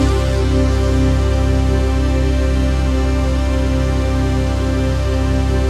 CHRD PAD; Best Service; XX Large Pads; Sample CDs

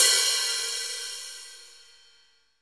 hat fuzn, Hi-Hats; Roland L-CDX-01; HH Modules

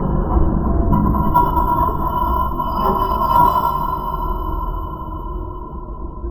Guitar Scrape; Sonic Foundry (Sony Creative Software); Loop Noir

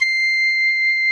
PICCOLO C7; Greytsounds; Scott Peers Sound Engineering

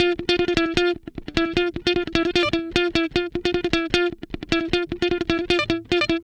Guitar Licks

